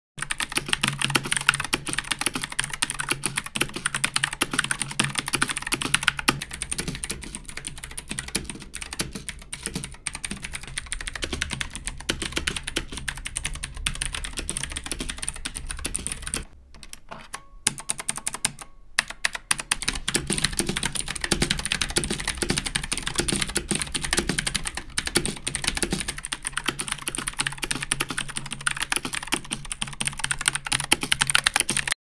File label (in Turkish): klavye sesi efekti; kali teli ses